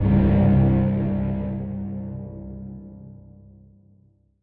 Danger Flourish; Insanely Twisted Shadow Planet

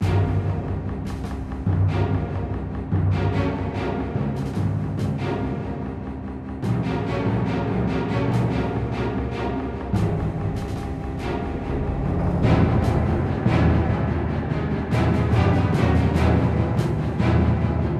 Battle Music; Platoon

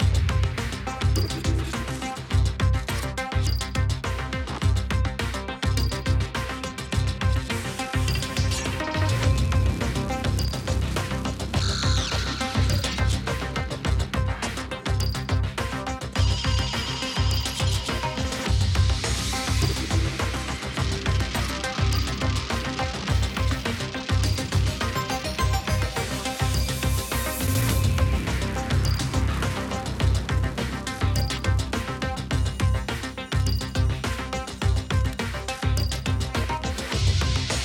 Loading Loop; F1 2015

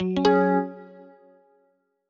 Jingle Normal; Clubhouse Games: 51 Worldwide Classics

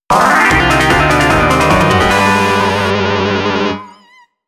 STAGE CLEAR; スプラッターハウス PART3

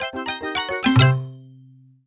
Bell Foghorn

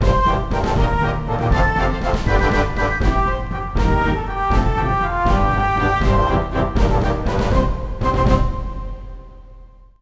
Duel Music Win

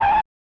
Screech; mohawk and headphone jack; snes

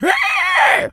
pig scream short; Infernal Radiation

